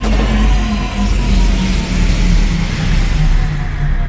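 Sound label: Fly Vehicle Car land; Star Wars Clone Wars; XBOX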